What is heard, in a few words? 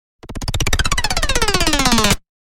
Metallic Scuttle, Metallic scuttling pitter patter sound.; Strange Tones; Sound Design